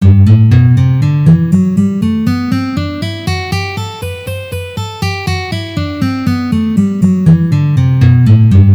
Gamma Sol mazhor Tri noty pozitsiya

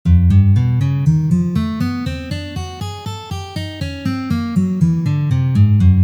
Trenirovka na gitare pauk

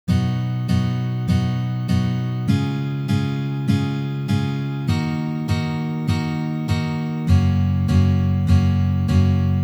Uprazhneniya dlya igry akkorda Si mazhor na gitare

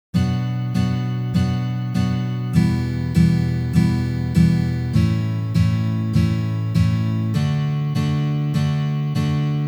Uprazhneniya dlya igry akkorda Si minor na gitare

